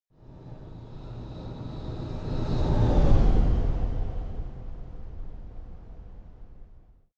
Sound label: sci-fi vehicle train passing long; raumschiff